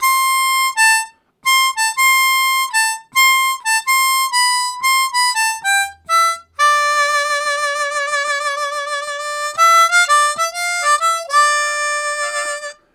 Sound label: Repeating, Harmonica Riffs; Zero G